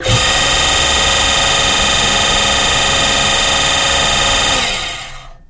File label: Jet Boat Water Pump Th, boat thrust